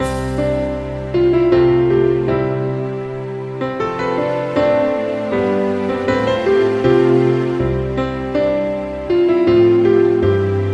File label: theme ringtone; The Newsroom